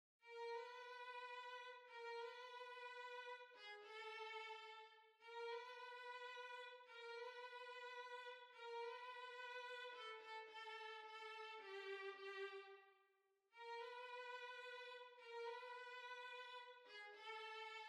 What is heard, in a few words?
Waltz, Violin; Altenberg Waltz